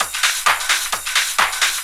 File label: drum 130, techno trance drumloops